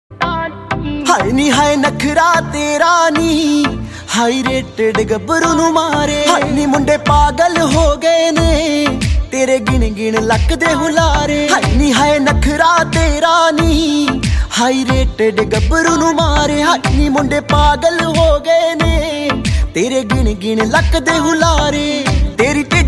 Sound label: new song status ringtone, heart touching status